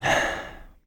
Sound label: PHAH